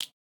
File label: snap, interface sounds; p0ss